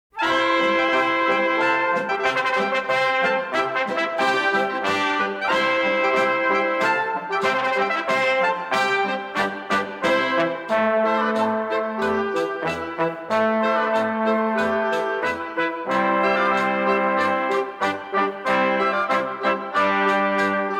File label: medieval march